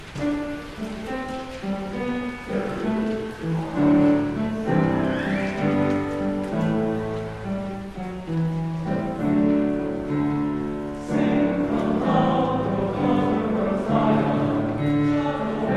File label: Choral Sing Aloud